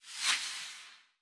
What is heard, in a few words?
Cleansing Wave; Rabbids Multiverse